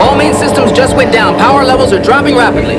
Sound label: power dropping; LaForge; Episode9